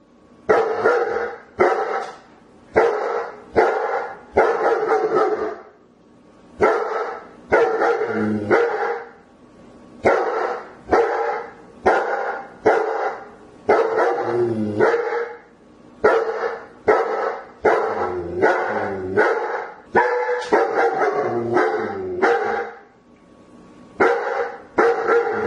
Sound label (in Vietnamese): Tieng cho Becgie sua